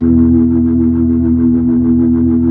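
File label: B-3, Hammond B-3 organ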